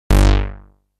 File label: Plasma-Bass 098Hz